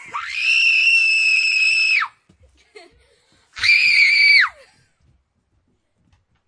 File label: two screams, Children